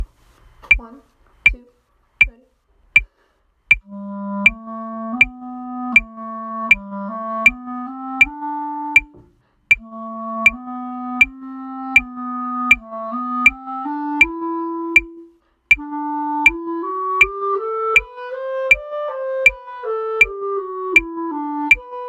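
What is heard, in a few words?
bass clarinet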